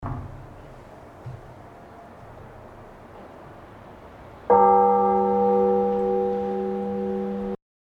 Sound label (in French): cloche; Tallenay